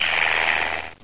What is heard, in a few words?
pain, headcrab poison; hl2